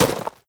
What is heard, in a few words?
step, footsteps player debris